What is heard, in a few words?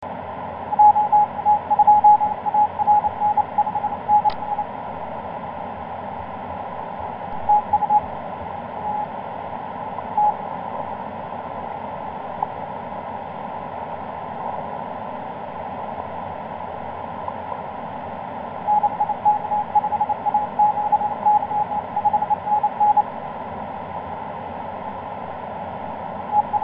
17m cw; ja2arn